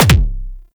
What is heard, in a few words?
Alt Fire Punch; Weapons; Warframe; Tenno; Oraxia Flechette